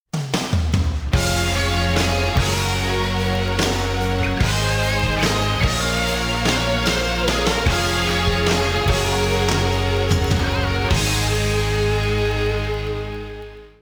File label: first music